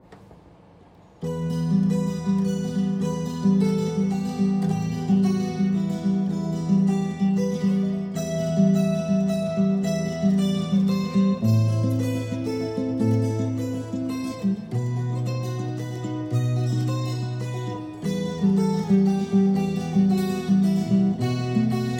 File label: spanish romanza